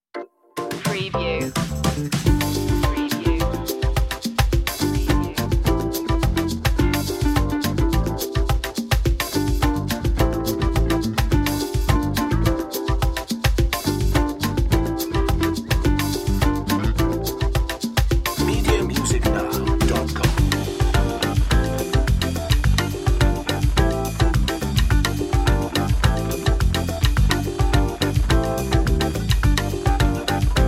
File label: classy night; brisk